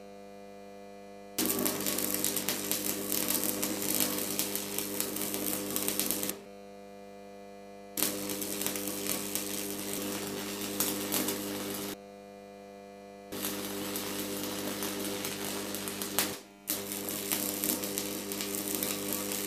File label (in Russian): svarka